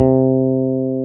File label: BASSES, Roland